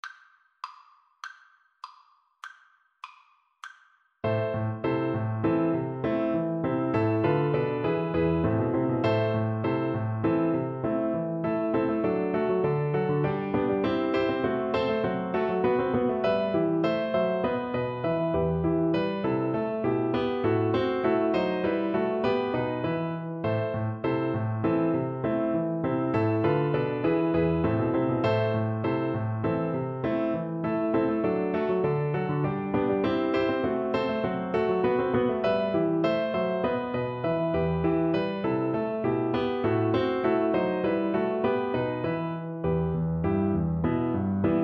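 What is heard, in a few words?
recorder karaoke; bach badinerie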